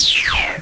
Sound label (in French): chute